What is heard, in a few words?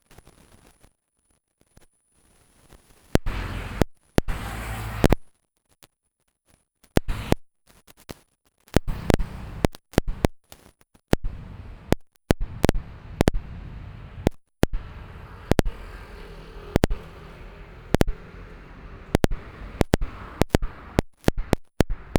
Bangkok broken recording; Bangkok-Koh Tao